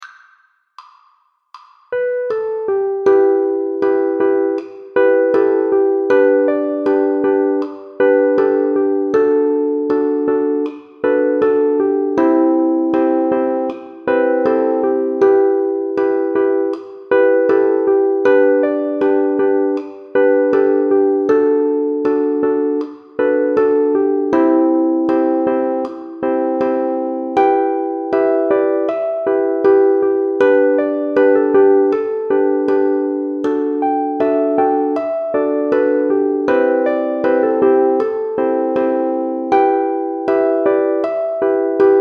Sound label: melody chord 80bpm